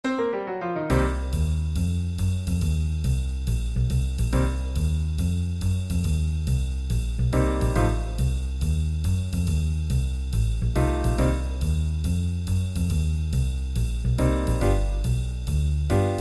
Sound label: Intro music jazz